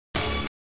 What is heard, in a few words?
pickup tone; Global Gladiators; Amiga